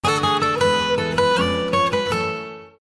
Guitar Txt Tone

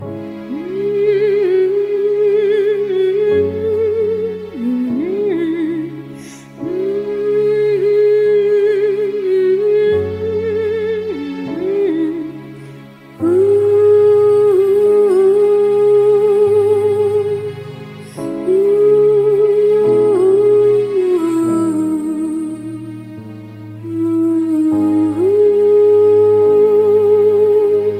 Hum

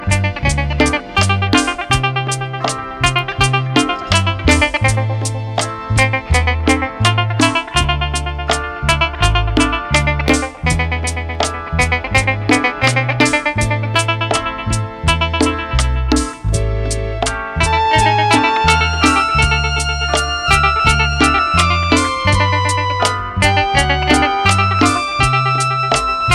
Country Track

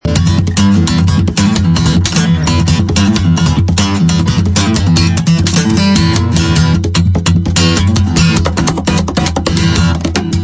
instrumental

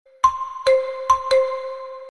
Xylophone Ding

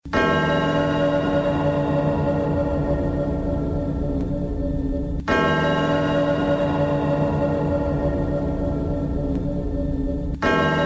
Gold Bell; One Piece

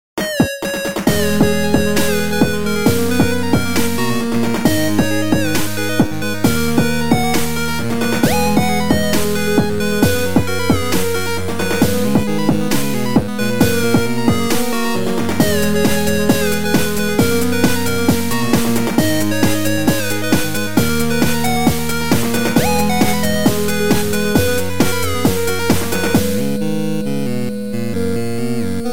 Tokyo Ghoul 8-bit OP

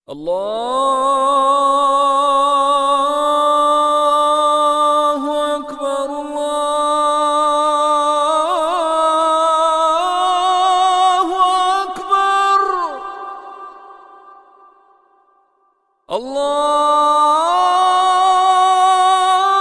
azaan

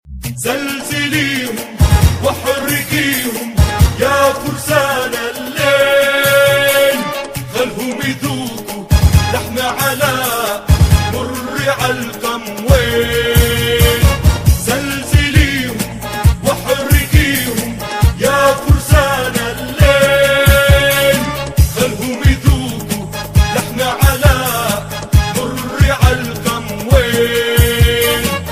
arabic

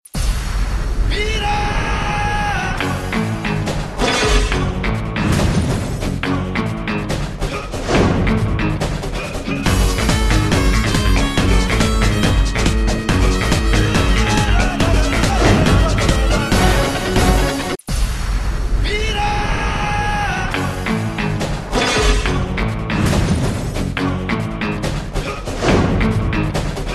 flute

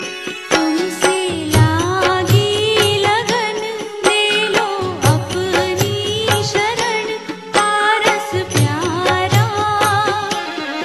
jain bhajan

